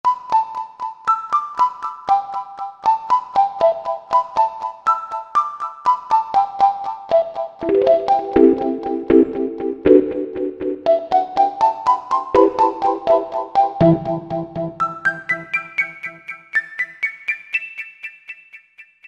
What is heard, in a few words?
Pop, Korg M1; Factory preset examples